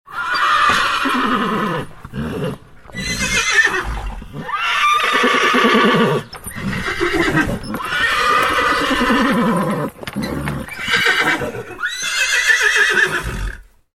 horse ringtone

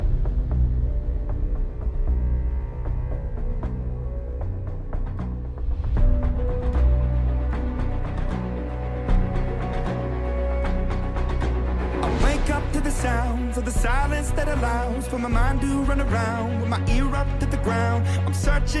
arcane ringtone

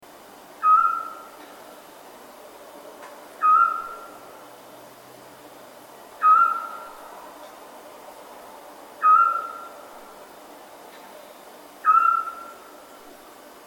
owl sound at night ringtone

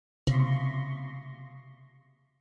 cool short sms ringtone

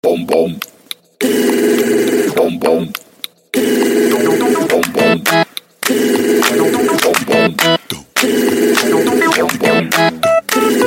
moto g4 plus ringtone

